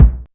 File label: natural kick drum single shot a sharp key